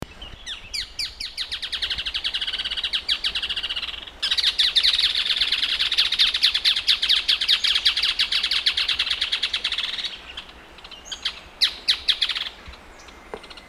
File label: Striated softtail